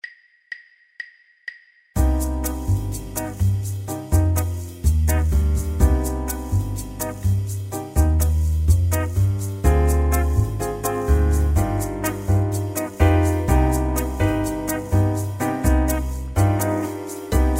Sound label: Harmonic Minor Scales Backing Track; Improvising With Harmonic Minor Scales